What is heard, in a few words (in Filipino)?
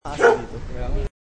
isang tahol